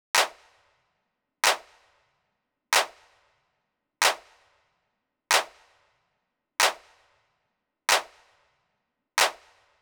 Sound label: Claps, Drum Tracks, Amin; Hip Hop; big fish audio; Hip Hop Exotica; Apple Loops